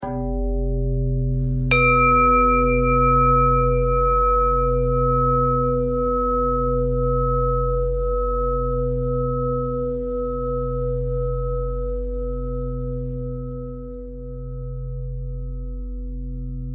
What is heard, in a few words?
bell threads